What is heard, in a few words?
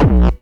BD Distortion, Bassdrums; Vengeance Samples